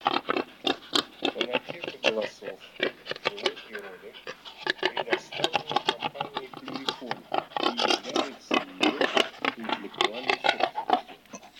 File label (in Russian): Кабан Cinghiale; plurifon